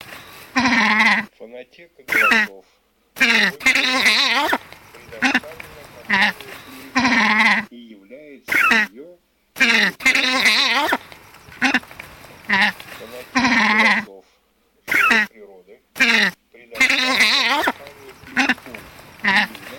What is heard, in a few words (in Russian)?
Синьга Orchetto Marino